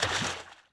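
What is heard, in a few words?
bodyfall water; foley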